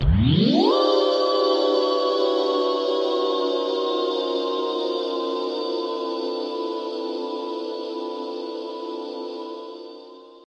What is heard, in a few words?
spinup and descend pad; Pads